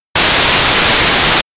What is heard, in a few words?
Noise; PSYN II